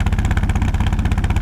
Loop, Engine, buggy

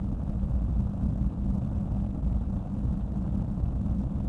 ambient; mars

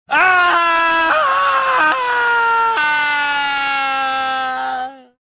falling; reborn

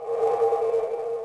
scrape; Quantum Redshift

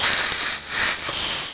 moan

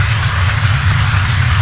loop, ships engine; Quantum Redshift; jaya

